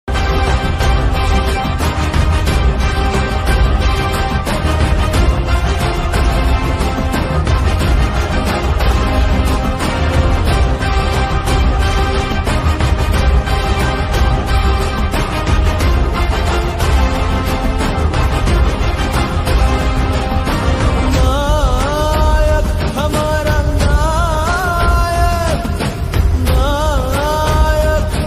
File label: song ringtone; kanguva; surya